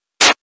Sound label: SFX Whip rope